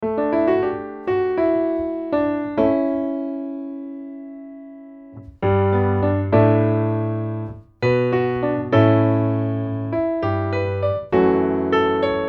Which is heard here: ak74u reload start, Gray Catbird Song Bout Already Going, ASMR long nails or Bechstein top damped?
Bechstein top damped